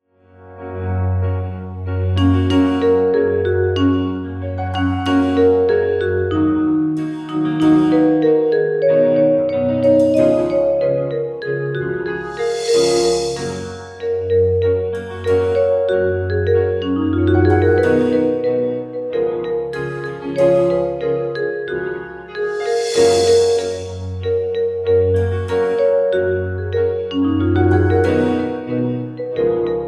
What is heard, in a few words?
Jingle Bells